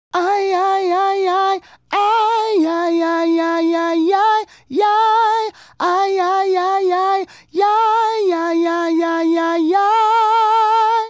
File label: Male Solo, Hip-Hop and RnB Vocals